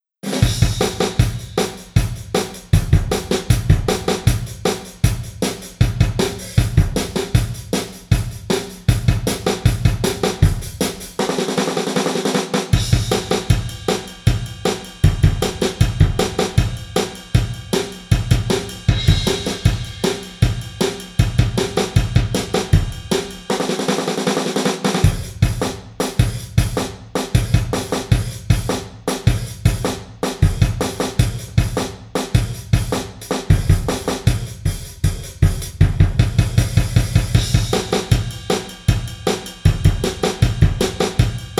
medium hall donut rod (drums only); tascam

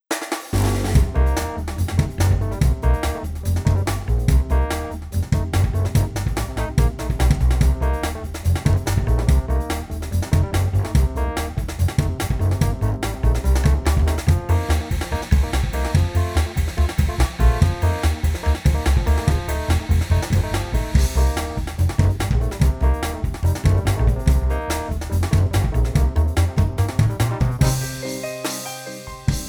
beefy room tuned high rod; tascam

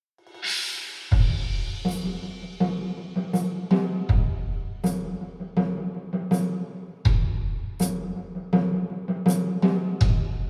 church mallet (drums only); tascam

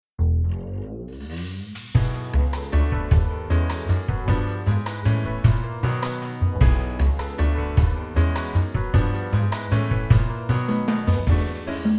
big and bright hybrid; tascam

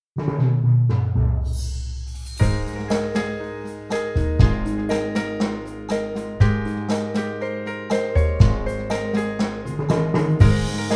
beach house snares off rod; tascam

